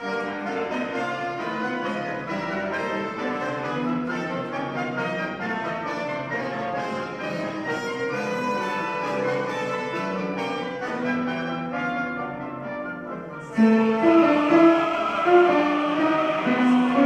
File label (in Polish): tenorzy; cardillac